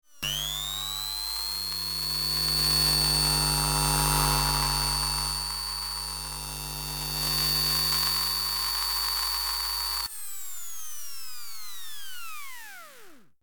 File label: Grinder electromagnetic textures